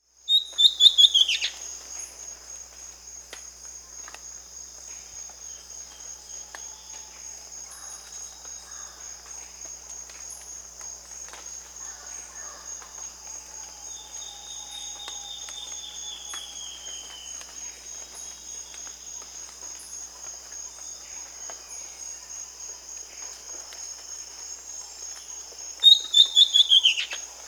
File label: Southern Chestnut-tailed Antbird (Myrmeciza hemimelaena), Peru; S. America